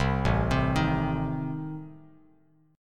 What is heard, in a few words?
A Diminished Bass Standard; Adim arpeggiated; 8,0,10,8; JGuitar